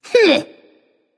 tongue; smite; voicepack; hera

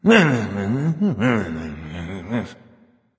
grumbling; chronos

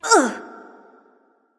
grunt hit; smite; serqet

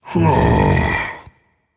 sigh rage; chaac

